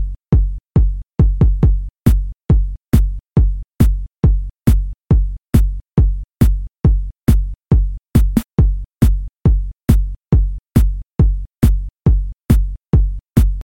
March beats

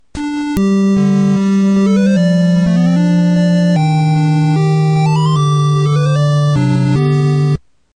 Triforce Piece; LOZ

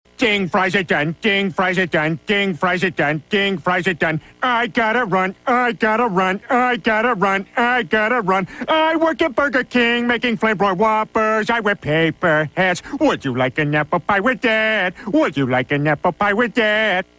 Song

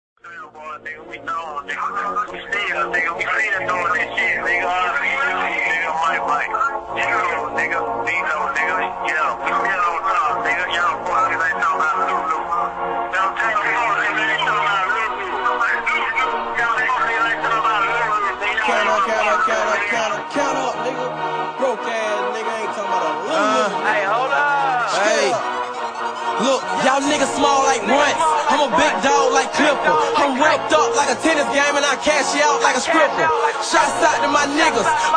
loop

